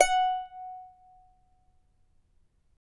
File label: charango F#4; Spectrasonics; Hans Zimmer Guitars VOL-2